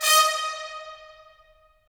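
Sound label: brass trumpets staccato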